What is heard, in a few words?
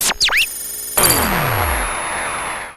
noise